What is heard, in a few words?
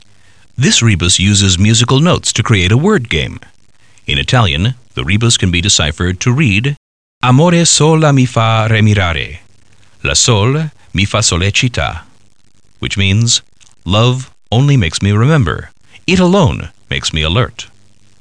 drums